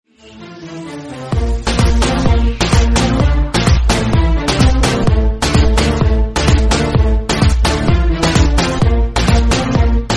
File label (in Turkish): elektro zil